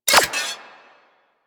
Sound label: Sniper Rifle Mech; Warframe; Weapons; Corpus